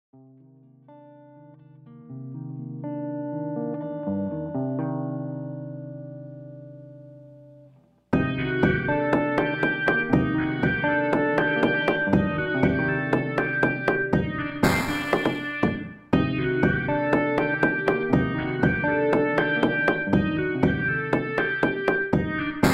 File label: desert, 暗い